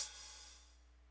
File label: hihat; Big Fish Audio; Hardcore Breakbeat Action; Robot Live